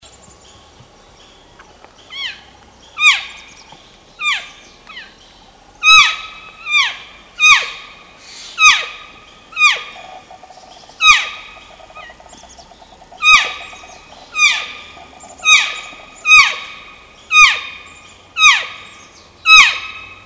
calf seeking elk

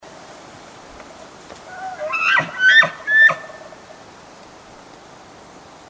grunts, elk; Chuckles; coming from a bull elk's throat

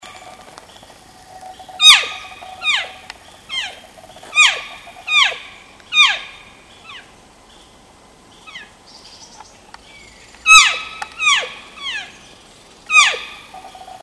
calf seeking leaving, elk

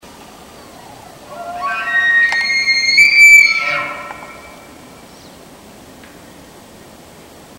3 note bugle, elk; 3 Note Locator

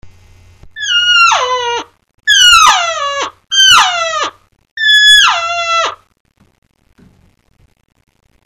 cow mew hand, elk

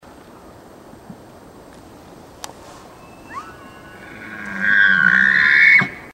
bugle lost voice, elk

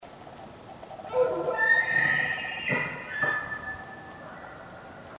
bugle bark, elk

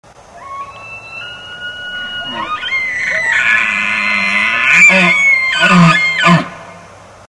competing bulls, elk

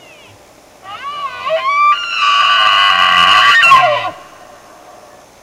herd bull screamer, elk; Screaming Challenge

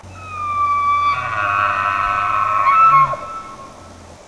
alpine bugle, elk

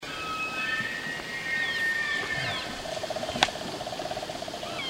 cow mew, elk

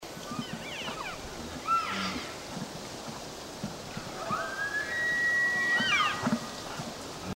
cows bugle, elk

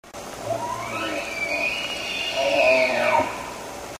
6x6 leaving dejected, elk

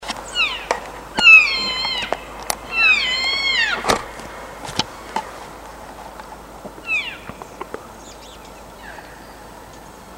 estrous cow, elk; Estrus Whine

